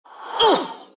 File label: pain; dota; death prophet